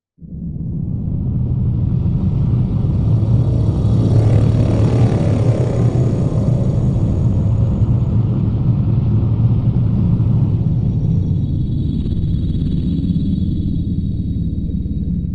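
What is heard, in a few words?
astral textures spirits winds eerie airy scary; Sonniss GDC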